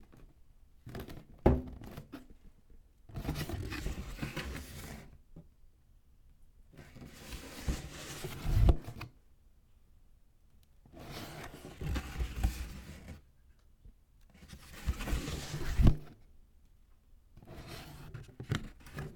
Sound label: Cardboard Box Opening